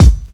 KICK

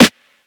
SNARE

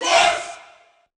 VOX; Treble Lordz Presents Memphis YN Kit

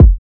KICKS; Treble Lordz Presents Memphis YN Kit

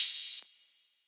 HIHAT; Godbody Streetz Kit

